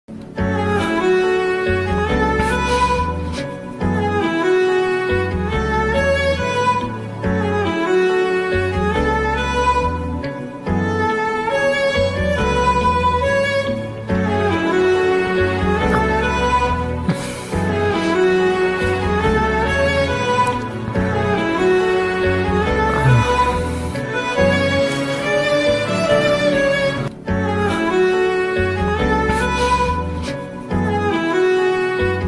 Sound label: emotional love bgm ringtone love feel bgm love failure bgm